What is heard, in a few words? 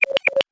Tweet sound; Samsung; GT-I8320 Vodafone 360 H1; Ringtones